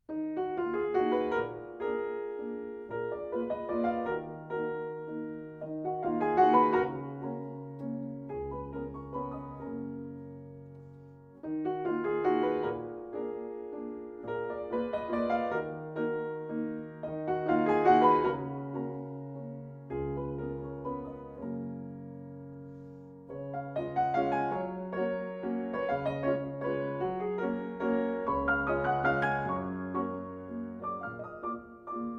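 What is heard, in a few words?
Ländler in E-Flat Minor; D.366 No. 12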